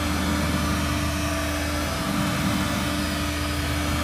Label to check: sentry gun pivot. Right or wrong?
Right